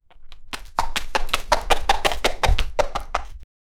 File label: dog sprinting fast with p